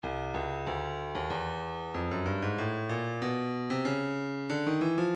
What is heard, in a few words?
stylus breakbeat midi 094 bpm